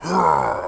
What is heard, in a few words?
Fort Vox; Sid Meier's Civilization 4 Complete; Warlords; Units; Omen